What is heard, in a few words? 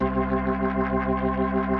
C2, B3